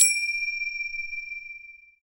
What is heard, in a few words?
percussion bells